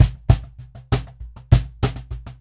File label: Cajon Loop 100BPM; musicradar